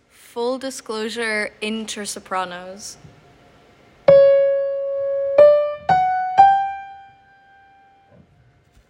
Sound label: Ending Lower Sopranos